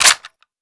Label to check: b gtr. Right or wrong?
Wrong